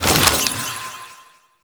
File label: Shrine Assemble Frag Collected; Warframe; Duviri Murmur